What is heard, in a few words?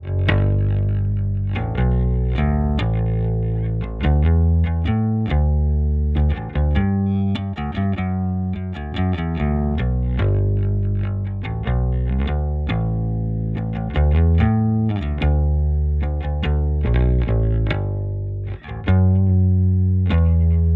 Bass